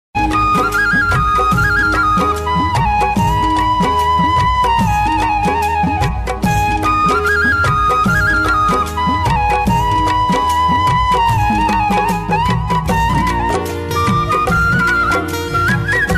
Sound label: flute bansuri ringtone sad music